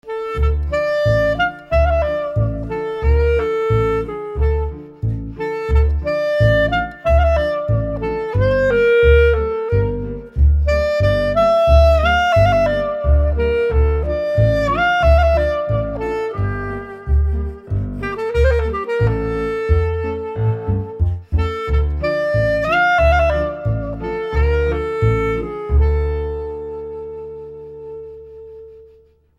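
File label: eastern europe clarinet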